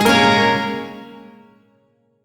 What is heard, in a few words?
Shawzin Yo Chord J; Music; Warframe; Duviri; Chords